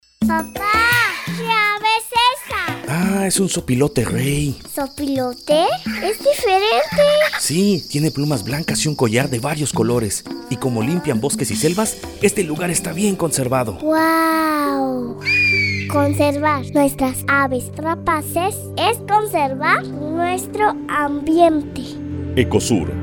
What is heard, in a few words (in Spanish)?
Aves Rapaces Zopilote rey